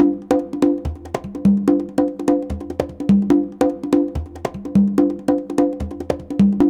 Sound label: CONGA BEAT; Zero G - Ethnic; CONGA BEATS